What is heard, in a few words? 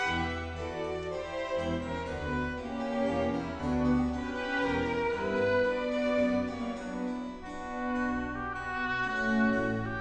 classical